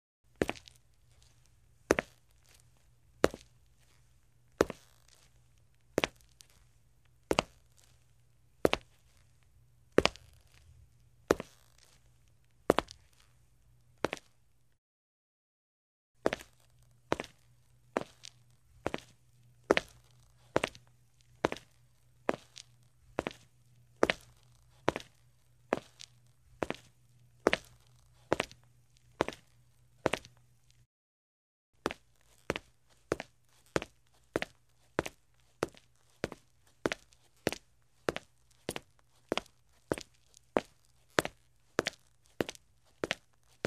Steps on the concrete floor in shoes

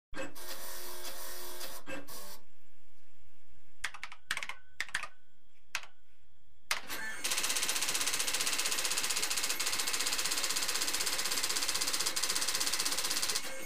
Matrix printer

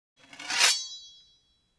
The sword is taken out of its scabbard; cold weapons

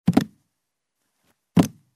Barefoot jump on the wooden floor; steps

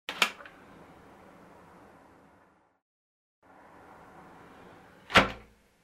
Wooden window open, close; windows

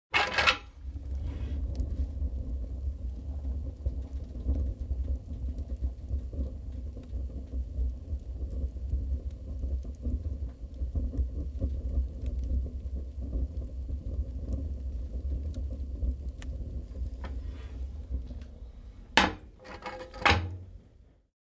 Fire in the oven, open, close the door

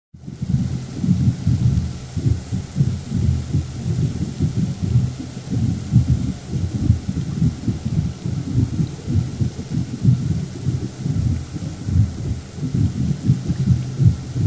Under water

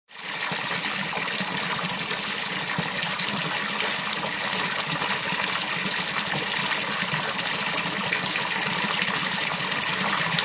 Water flows into a bucket

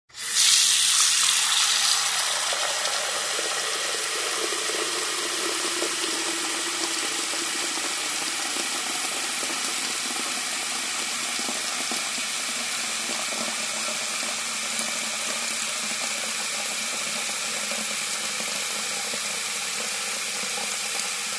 Water flows into a porcelain sink

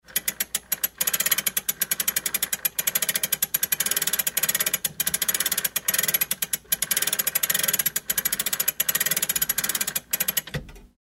Grandfather clock starts; watches

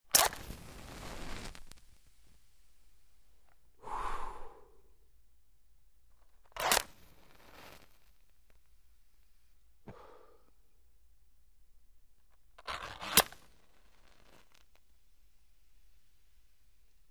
Lighting and blowing out a match; fire

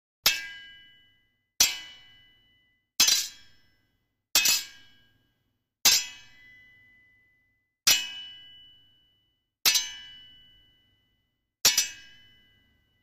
Sword strike; cold weapons

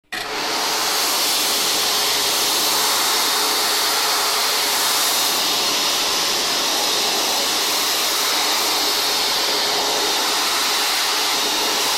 Hand dryer; toilet

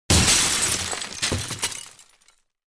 glass breaking

